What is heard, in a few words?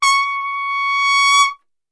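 C#4 trumpet; Best Service; Brass Super Section; TRP SWL; 90 s Sample CDs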